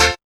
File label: PNO CHD